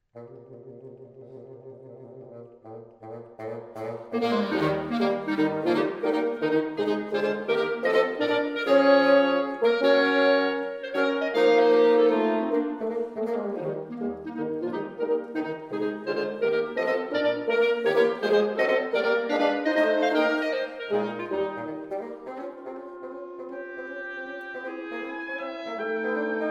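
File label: wind quintet; karg elert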